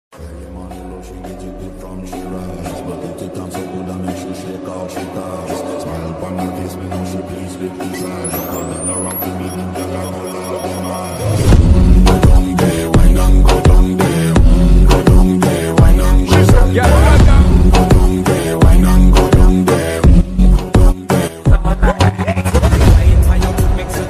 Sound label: attitude ringtone bgm ringtones english ringtone